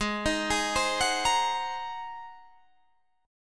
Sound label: G sharp 9th, Guitar, Open D